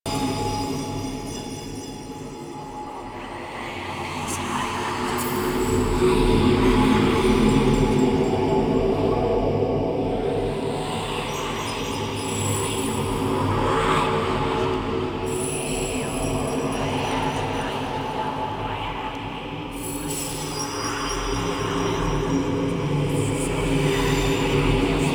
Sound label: Boon Menu Loop; Warframe; UI; Duviri